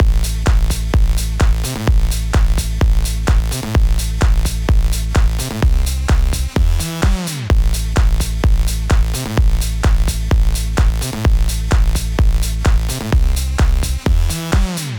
Music Loop

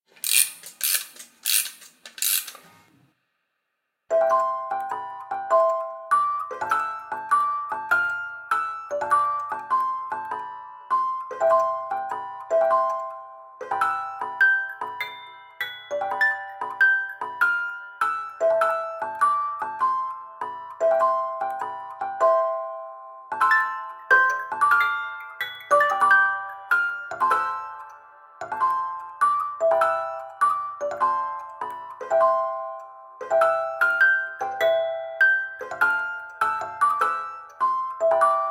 music box; darkness within 2 the dark lineage

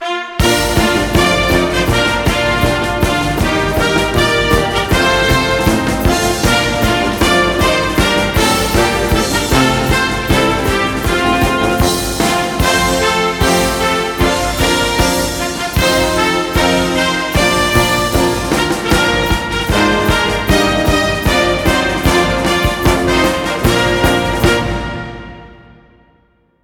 Broadcast Outro

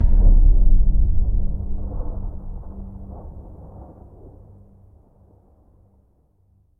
Combo G; Mixolumia

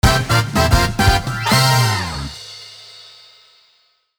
Found a Balloon!; Super Mario Odyssey

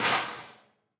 metal break; teardown ps5 windows xbox series xs gamerip 2022

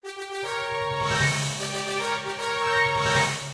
Epic Boss Fanfare; Trouserheart; Android

